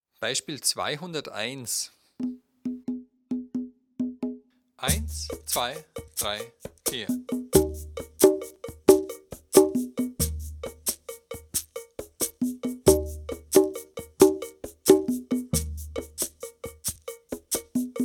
dreierrhythmus

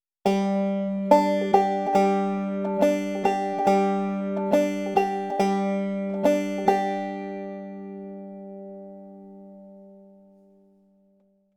bum ditty figur im takt

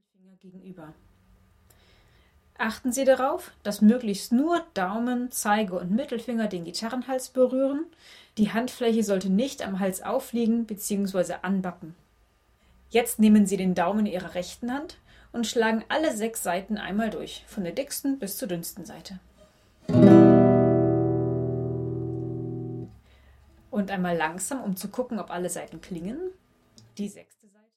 der erste Akkord e-Moll

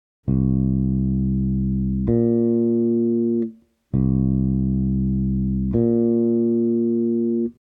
kleine Septime

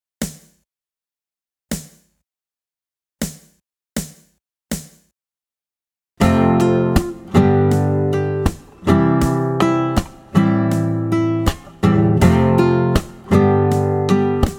strumming refrain langsam

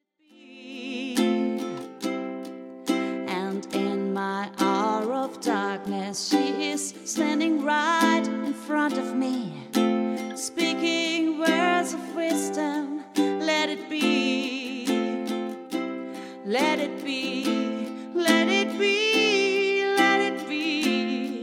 ukulele gesang

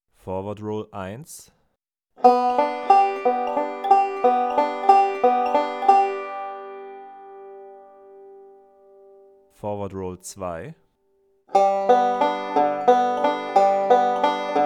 rolls im Dreivierteltakt

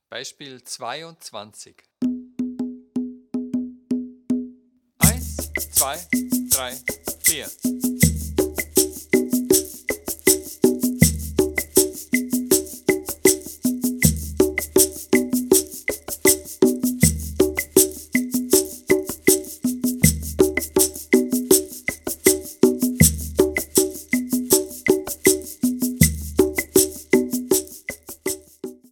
viererrhythmus

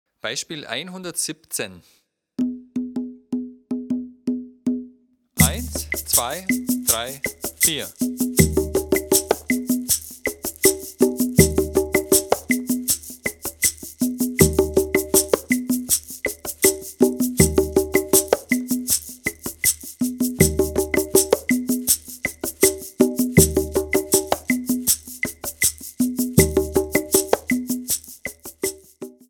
viererrhythmus

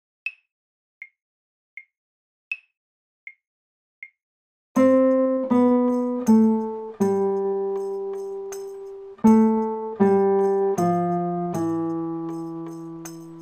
kleiner Walzer

